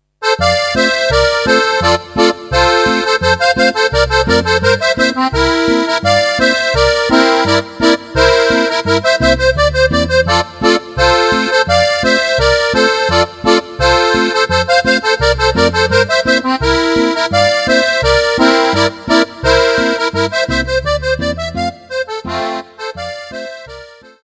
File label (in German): boarischer